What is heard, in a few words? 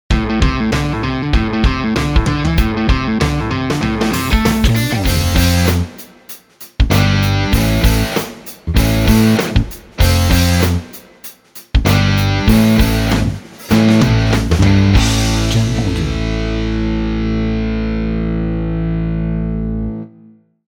Speed Rock Heavy Intro